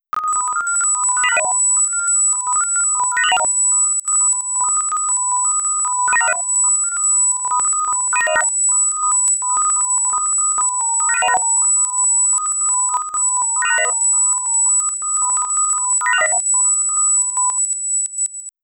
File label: Atrial Flutter